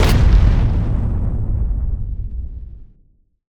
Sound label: explosion; spacemap; explosions